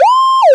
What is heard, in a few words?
warble, sirens; whelen 295